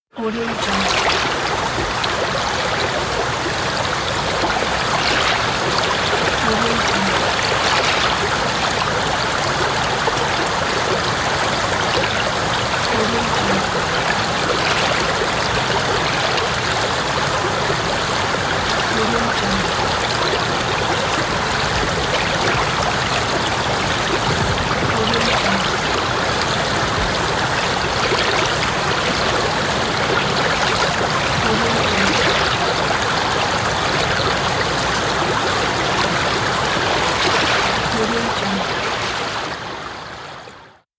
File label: river flow; ducentije